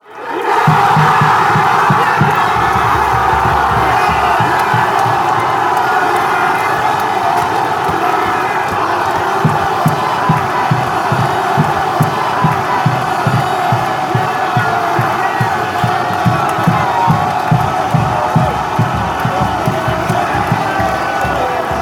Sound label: denmark score, fanchants